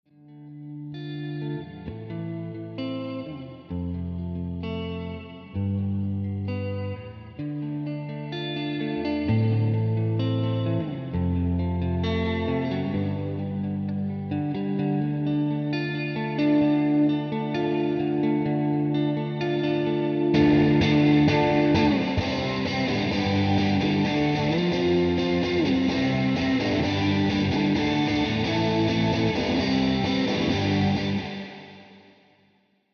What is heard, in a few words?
guitar room verb